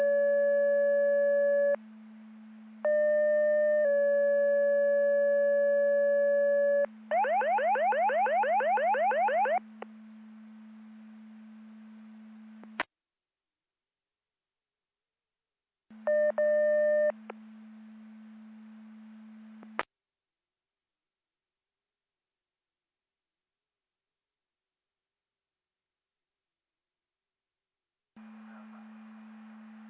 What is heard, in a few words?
Siren; Andover Twp Boro